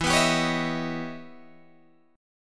E Minor Major 13th E Guitar DADGAD; EmM13; strummed

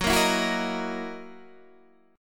F sharp 13th F sharp Guitar Standard; strummed; JGuitar

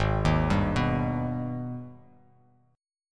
G sharp Minor Major 7th G sharp down; arpeggiated; JGuitar

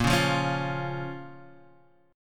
A sharp Minor A sharp, Guitar, Standard; 6,4,3,6,6,x; strummed; JGuitar

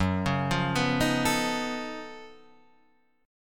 F sharp Minor Major 13th; {2 4 3 4 4 5} arpeggiated

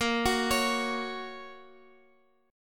A sharp Minor Sharp 5th, down, Guitar, Open G

